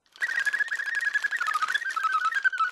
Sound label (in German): mech vogel